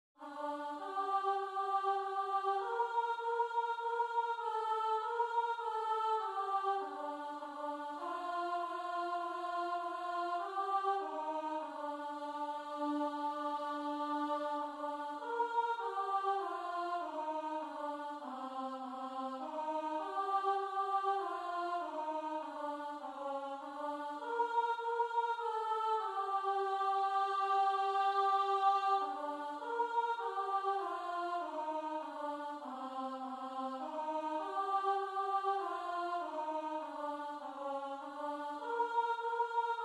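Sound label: soprano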